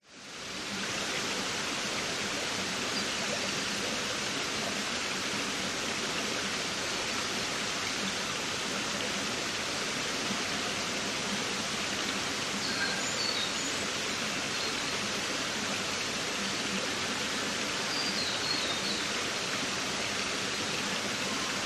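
nature creek forest water flowing birds young adults scream swim distance Queensland Australia; qld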